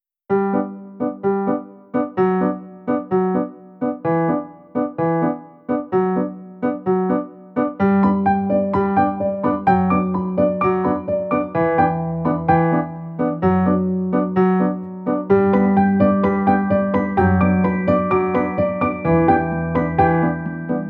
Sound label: HAPPY, music